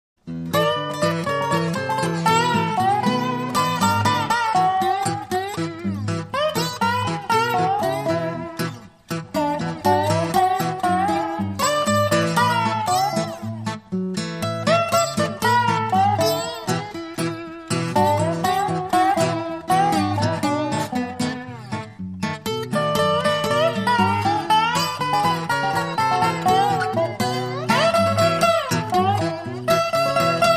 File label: Delta Blues Duet